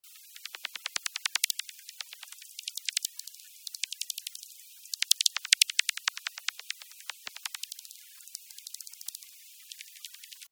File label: digital heterodyne